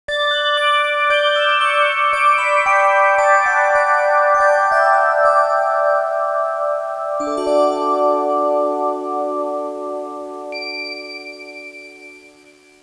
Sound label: Sleep